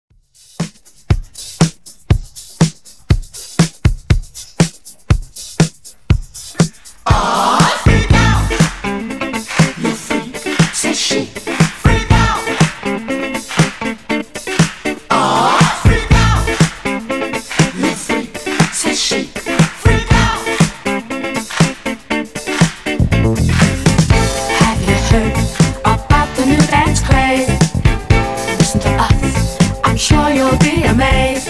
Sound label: Redrum 120 Bpm